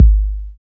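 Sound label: bd, 808 bass drum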